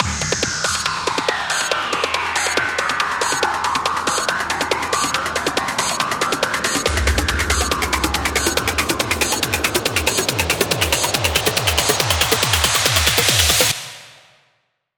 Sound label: 140BPM 8Bars, HandsUp & Trance Fills; Grunch; Vengeance Samples